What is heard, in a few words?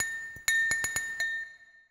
bottle, ethno, 125